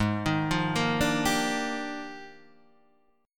F Minor 13th, G sharp

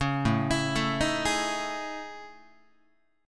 A Major Sharp 11th, Guitar; AM#11; arpeggiated; JGuitar

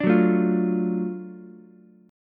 Gb Minor Major 7th Sharp 5th, Ukulele, chord; strummed; 7,6,5,8; JGuitar

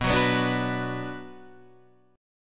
F sharp Suspended 4th Sharp 5th B

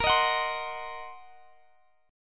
C Minor 7th Flat 5th Bb, chord

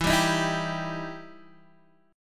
E Minor Major 7th E down Guitar DADGAD

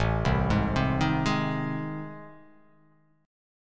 A Minor Major 7th Flat 5th

